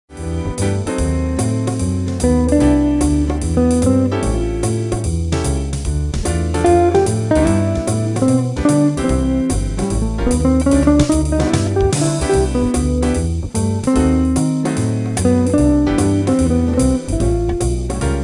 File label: Guitar; Die Aufnahme wurde eingespielt mit der Gitarre von Dr Martin Guh